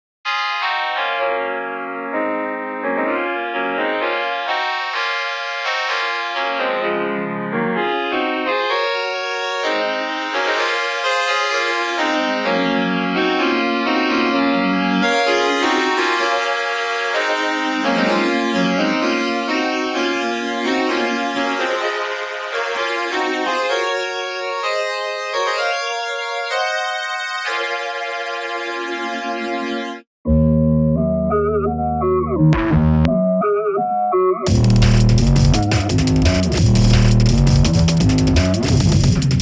Drunken Synth automated

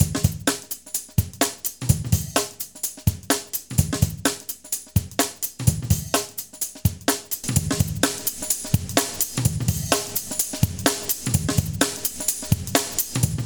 Serial Compressor x3; Doofers; Afta8

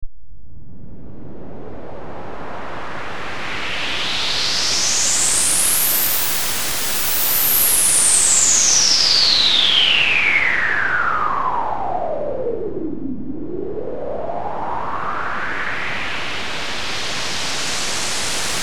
Noise Maker; Metalthrix; QiTaNo

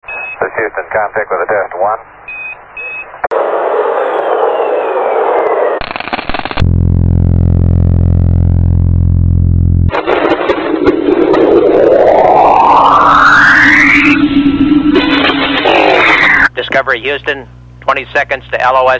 Nasa Sounds; Metalthrix; QiTaNo

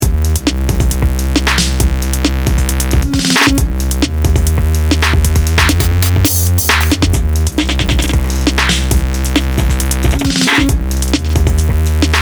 Glitch Box; Doofers; Thebellows

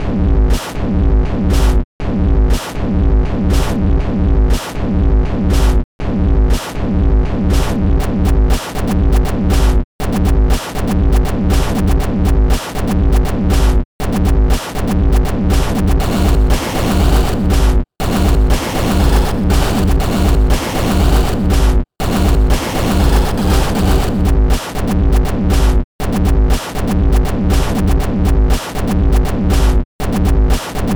Industrial Newbeat

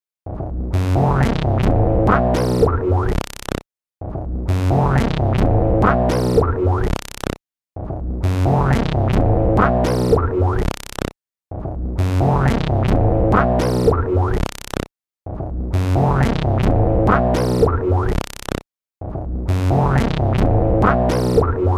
synplant fx

